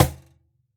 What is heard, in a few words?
Cajon hit fff; Idiophones; Struck Idiophones